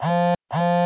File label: error